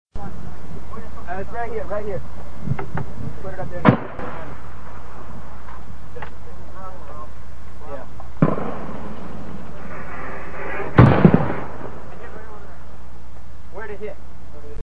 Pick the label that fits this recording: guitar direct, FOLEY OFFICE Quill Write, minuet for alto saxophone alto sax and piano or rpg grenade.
rpg grenade